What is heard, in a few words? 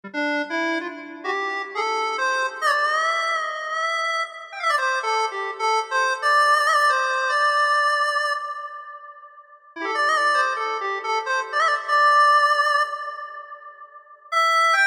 Bluesharp